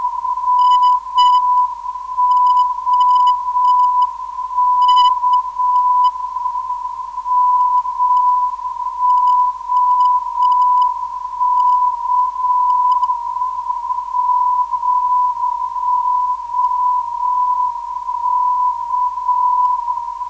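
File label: 304kHz, non-directional beacon; MOK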